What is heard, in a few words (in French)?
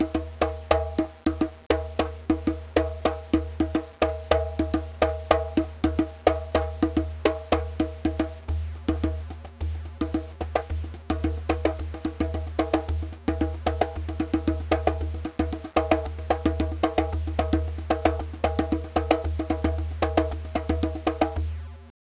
djembe